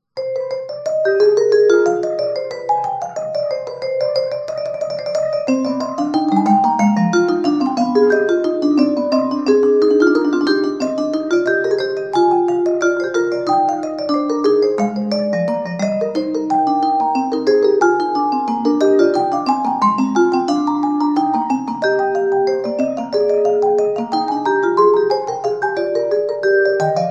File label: BWV 773 Invention In C Moll, Mallets; Bach for Mallets